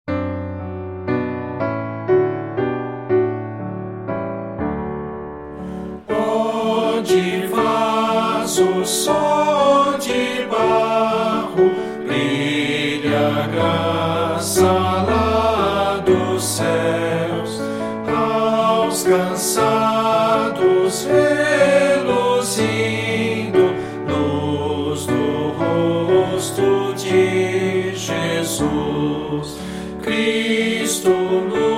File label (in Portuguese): voz piano